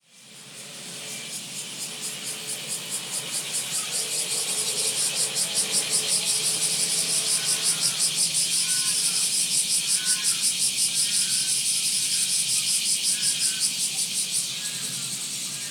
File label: kuma semi; 夏のクマゼミの鳴き声・環境音